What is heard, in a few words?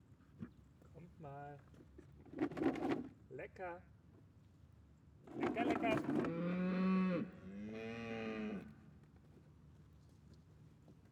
Farm Feeding Cattle Cows; Hof Koenig; Everswinkel; ORTF